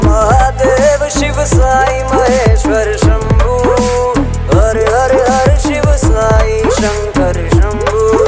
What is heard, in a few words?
message tone ringtone; mahadev shiv sai maheshwer shambhu har har har shiv sai shankar shambhu